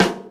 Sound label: classic subby snare sound g key; snare drum samples